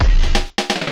intro drums; 129BPM; Pony Fan Music Remix Stems

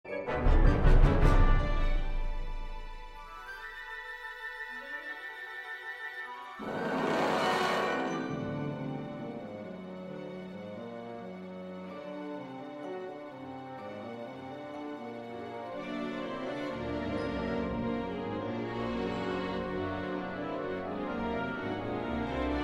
Orchestral

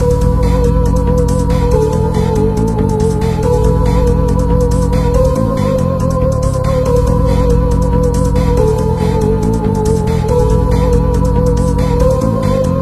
suspense

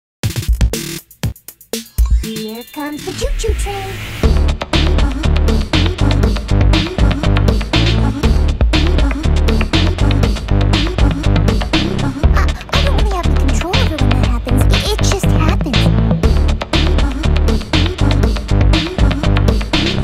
Choo Choo Train 8-bit SID

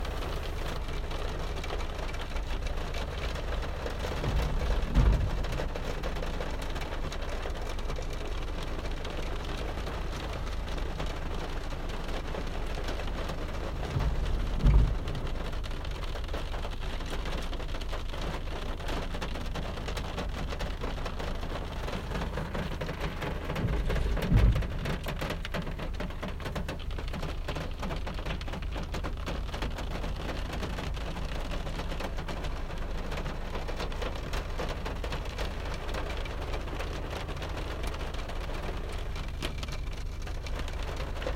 rain hitting the car windshield

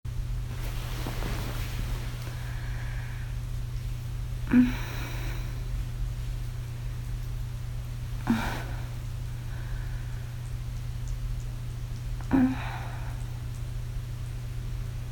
Waking Up, Just Noises; [Begging at the end though]

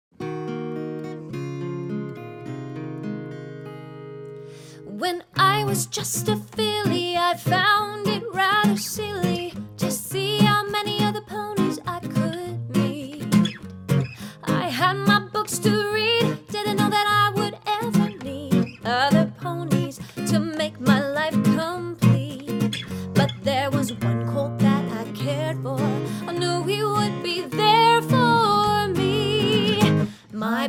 Live Acoustic Cover; Pony Music Archive

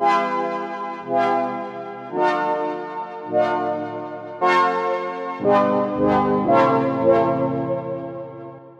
synth; Pony Fan Music Remix Stems

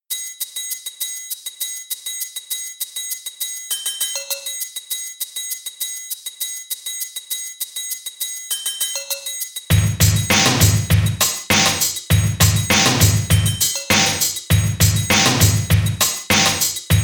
sound design shout beat

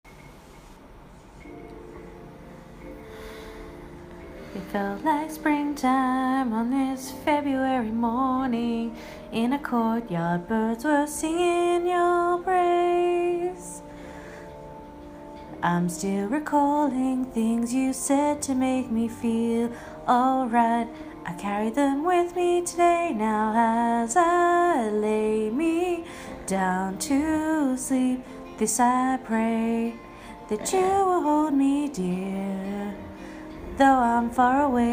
karaoke

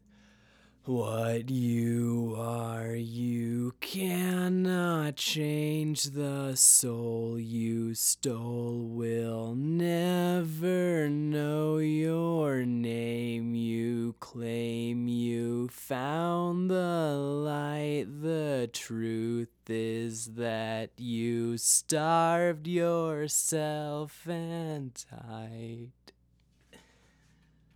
naked vox one breath take; what you are

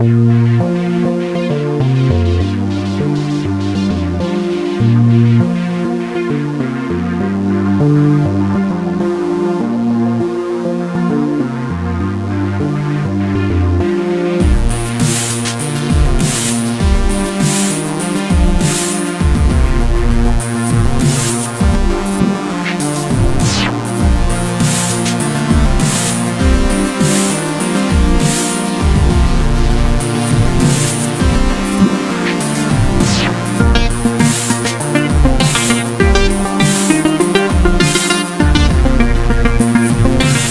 Relaxing Electro Music

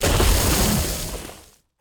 Monster; Zenless Zone Zero

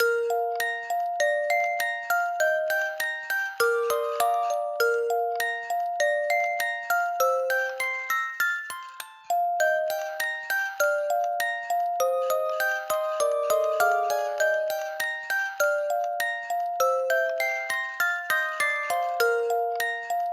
Ice Cream Express (Music Box)

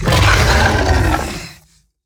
Monster; Zenless Zone Zero

